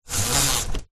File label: household blind roller pull; zapsplat